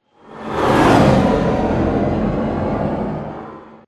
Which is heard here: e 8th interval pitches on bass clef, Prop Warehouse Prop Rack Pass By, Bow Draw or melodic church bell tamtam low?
Prop Warehouse Prop Rack Pass By